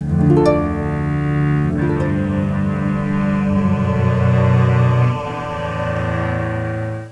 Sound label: Wizard Defeat; Lego Battles 2009 NDS